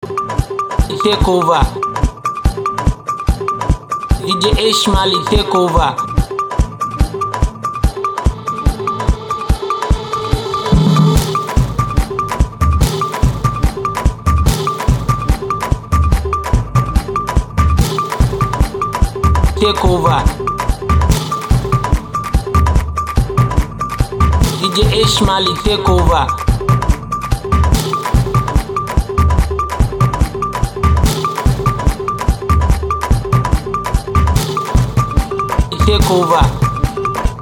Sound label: Romantic Mara Dance Beat